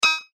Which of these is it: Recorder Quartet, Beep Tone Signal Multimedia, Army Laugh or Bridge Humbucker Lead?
Beep Tone Signal Multimedia